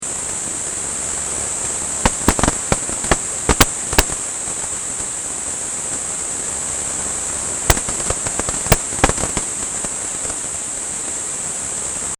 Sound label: sag mill gears